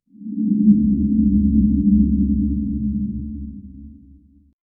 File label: deep underwater bubbles